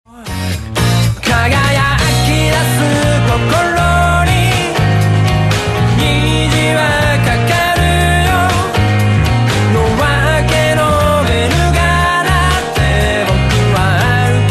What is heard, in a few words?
music